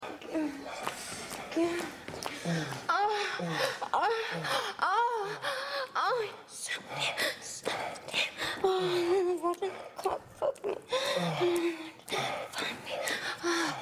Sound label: moan